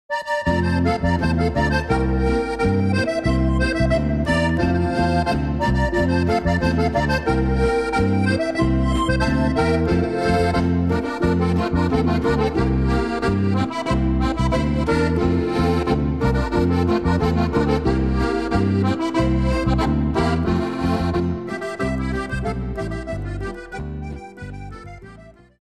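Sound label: wast l boarischer, Steirische Harmonika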